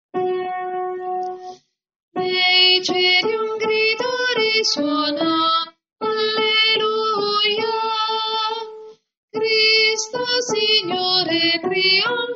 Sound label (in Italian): tenori